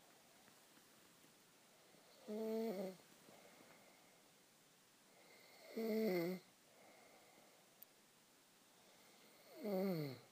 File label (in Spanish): ronquido